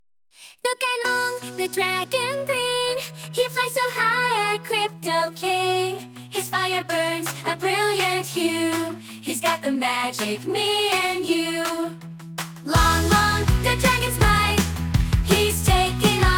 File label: song